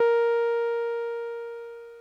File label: ebm, Omnichord; OmnichordGodot